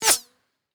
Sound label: bullet flyby pistol close; Rust